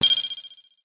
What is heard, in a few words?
button